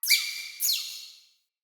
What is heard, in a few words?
Vocal Double Squeak, Animals; Player; Player Floppet